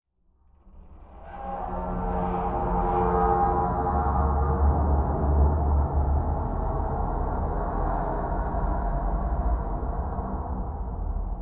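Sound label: Metal Creaks Eerie; ambience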